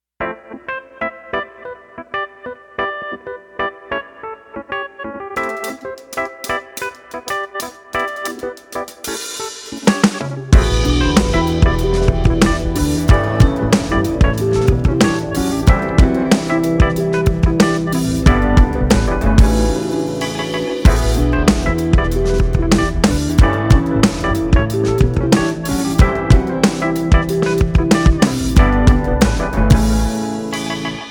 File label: Instrumental